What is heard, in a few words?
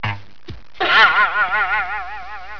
BOW ARRO